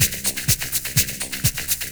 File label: 125 Dance Perc; Pro Tools Essential Loops; Dance DJ; Percussion